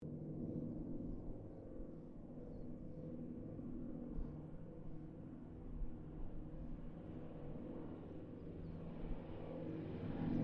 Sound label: AIRCRAFT CT4 WINJEEL WIRRAWAY HARVARD PASS; Mixed aircraft squadrons; Airplanes